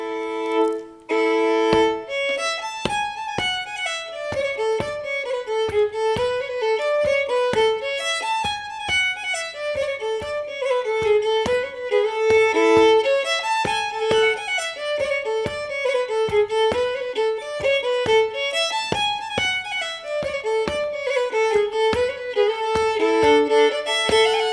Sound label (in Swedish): Polska från Kvällträsk; efter Janne Mårtensson